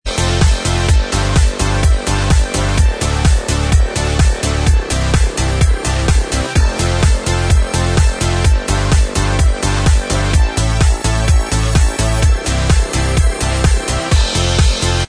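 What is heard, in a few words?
Instrumental Track, backing tracks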